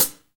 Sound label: HH, Kits, Rev